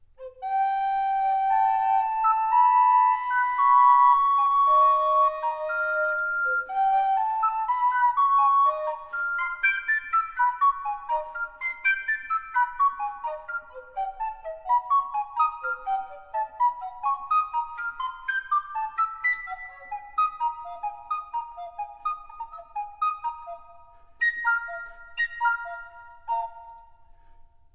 Etude, recorder

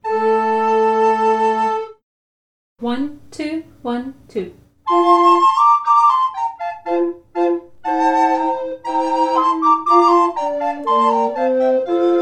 Alleluia Exultate FULL CONSORT; Mozart

